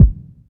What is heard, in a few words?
round bass drum sample g sharp key